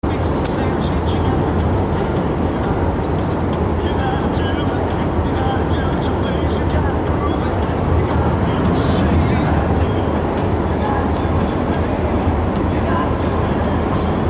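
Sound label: music playing while driving in tunnel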